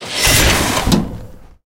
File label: torpedo launch